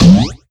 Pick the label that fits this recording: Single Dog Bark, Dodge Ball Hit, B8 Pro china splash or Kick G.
Dodge Ball Hit